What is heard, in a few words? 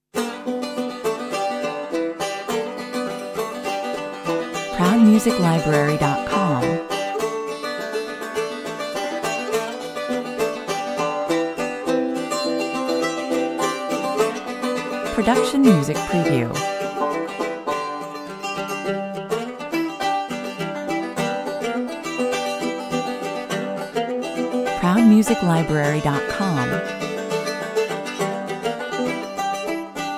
13 strings, 103 bpm